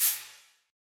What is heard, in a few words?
percussion